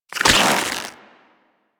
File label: Gas Arrow Tether Attach, Weapons; Warframe; Infested; Derelict Cernos Prime